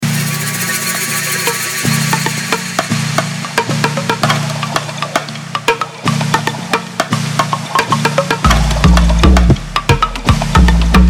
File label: Rare Percussion Afro Drum; Futures vol 3